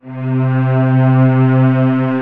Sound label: e4